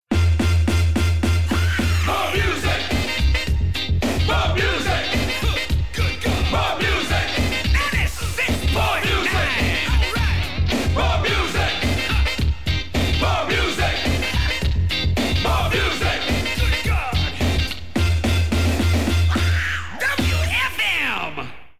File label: Jingle